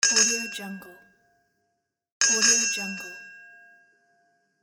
tram bell

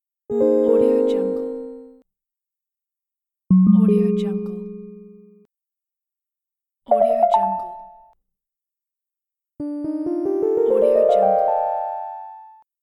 pleasant software ui

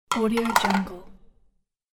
plastic bowls setting

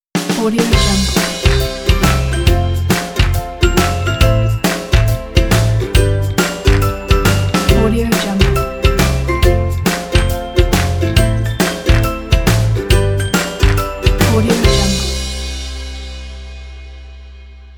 happy kids intro logo

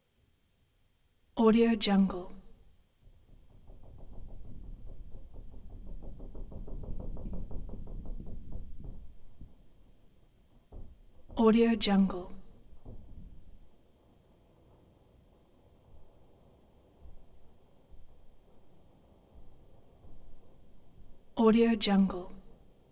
wood creaks constant ticking stretches; prosoundfx

